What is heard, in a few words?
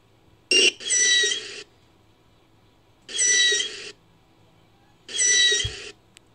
dugong